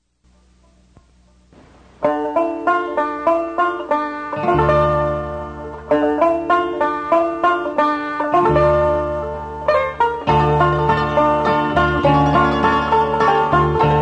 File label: banjo